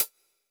hi hat closed; yamaha; reface cs; stan kit 2

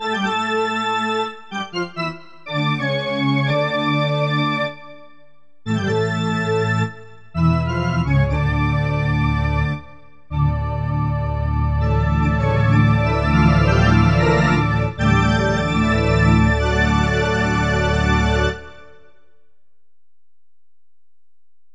fugue; yamaha psr-e373

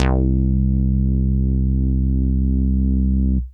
saw bass, yamaha reface cs

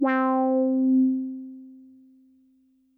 cs wah, yamaha reface cs

